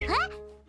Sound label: noises; gabriel dropout; satania